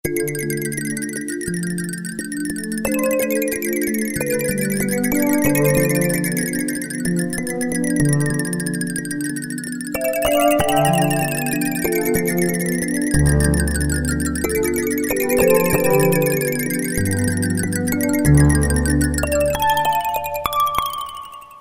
Ice Rain, Roland MT-32

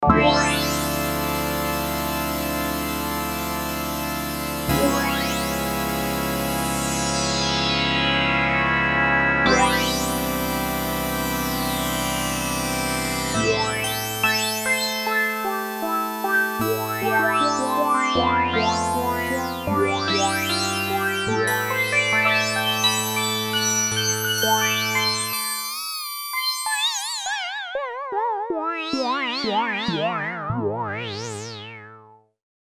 RESONANCE SWEEP, Oberheim OB-8; Factory Patches

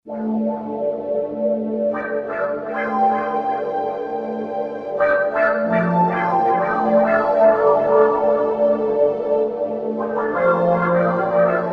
Lo-Fi Auh Auh; Roland SC-880; Factory Patches

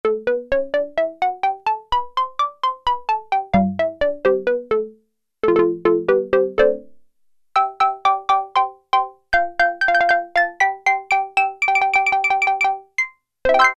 Xylophone, Roland Juno-106; Factory Preset

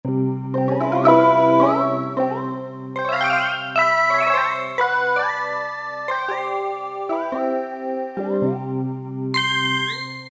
Ethnic Fifths; Roland PN-D50-02

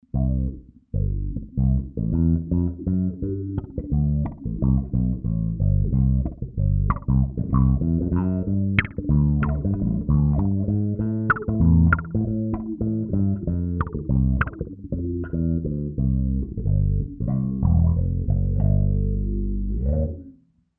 DROP, Zoom 506 II

